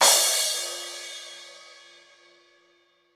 crash cymbal a sharp key, Royality free crash one shot tuned to the A# note. Loudest frequency: 5734Hz; cymbal drum samples